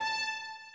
High Violin

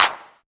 normal hit clap

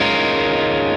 6 6 6 6 6 Db6add9

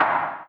Clap long

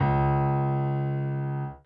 C#3 Db3, Piano

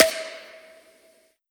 soft hit clap

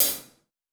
hihat

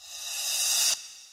Buildup

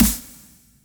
normal hit clap; osu

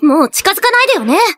applause

